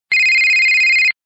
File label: Ring; Entourage